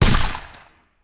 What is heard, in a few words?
Musket; Sound Ideas; Guns